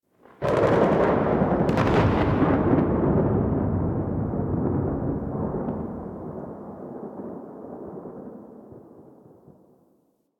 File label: Exterior Rolling Thunder; Ambience; Duviri; Warframe